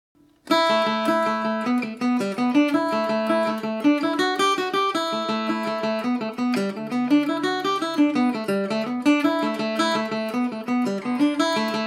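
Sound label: A dorian at 40 no repeat, irish mandolin; The Ten Penny Bit; Ashbury AT-40 tenor guitar (no repeat)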